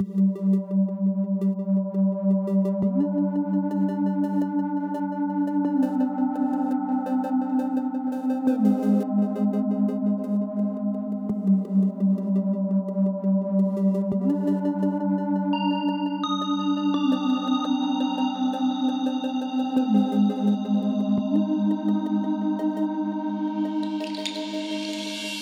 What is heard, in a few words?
Haunted Synth; Solos; BB 2HTC Samples Vol 4 Addendum